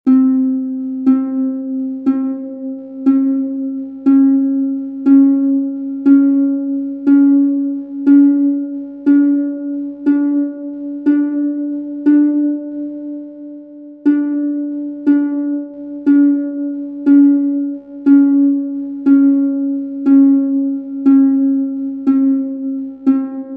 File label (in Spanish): doceavos cuerdas